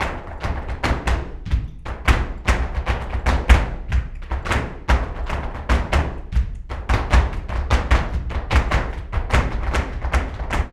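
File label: percussion, ALEGRIAS TAP, Flamenco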